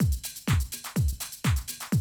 125, Classic Drum Loops

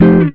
GTR CHORD; Univers Sons; Jazzistic

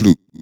Fx Vox; Equipped Music; Loungin House

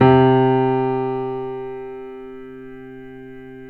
PNO MF; Synclavier Sampler Library

